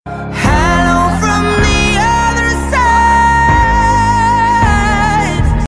hello hello from the other side

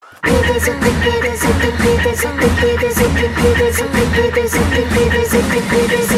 fiddle; fiddle skill

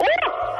uah

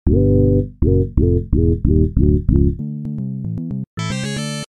game over; rygar

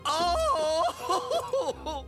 auauauauohohohoho

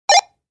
radio beep

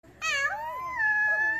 wolf pup aroo

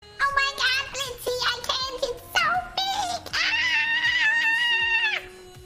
ahhhhhhhhh

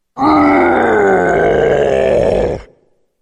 death sound; Malphite

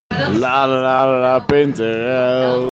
lalala

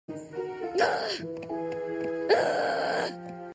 ugh; twilight sparkle

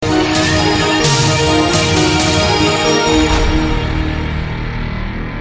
keytar solo

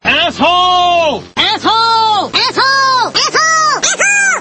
arpeggio dominant 9th

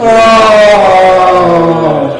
ohhh

